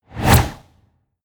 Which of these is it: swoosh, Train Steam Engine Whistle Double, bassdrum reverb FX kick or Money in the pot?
swoosh